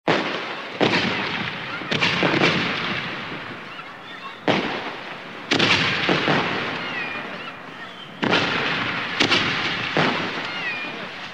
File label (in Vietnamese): phao hoa; tet holiday